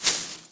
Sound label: shrub hit